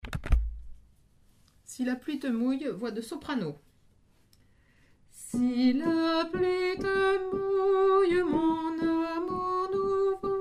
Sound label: soprano; si la pluie